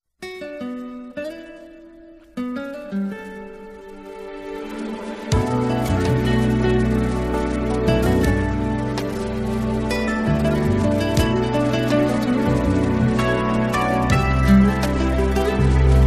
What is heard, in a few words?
Instrumental